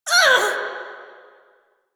Pain Lich B

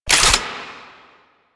Sniper Reload

